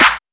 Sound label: D&B Clap, Drums Hits Claps; neuro